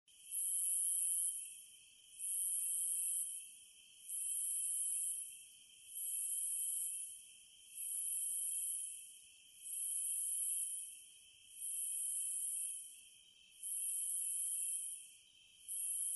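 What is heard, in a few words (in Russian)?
цикады в лесу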